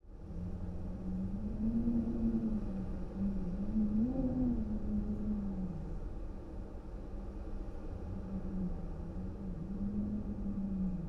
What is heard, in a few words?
Wind, Interior, Howl, Tonal, Mumble; Hzandbits; Howling Winds